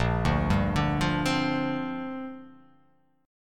A sharp 6th Add 9th

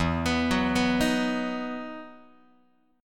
E Major 7th Suspended 2nd, Guitar; EM7sus2 arpeggiated

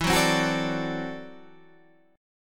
D 9th E, guitar; D9/E; strummed

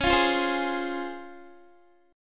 C sharp Major 7th C sharp Guitar; C#M7; strummed

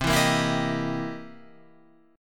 C 7th Sharp 9th, C, down, Guitar, Open F; strummed; chord; JGuitar

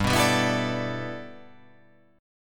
G 6th Add 9th

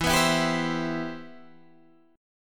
E Minor Add Flat 13th

